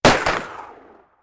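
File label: magnum exterior shot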